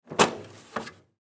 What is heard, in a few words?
Copy Machine Cartridge Compartment Open; Office; Blastwave